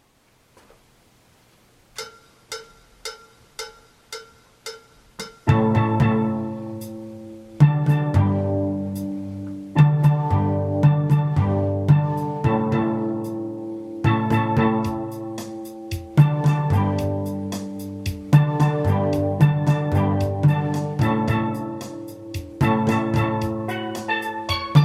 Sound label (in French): Basse Intro